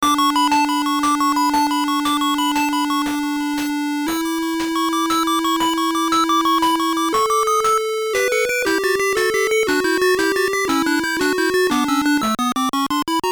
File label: BGM, WonderSwan Color; Pocket no Naka no Doraemon